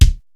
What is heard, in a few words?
SPD-8 KIT; Rubber Chicken Software Sample Bank; DRUMS + PERC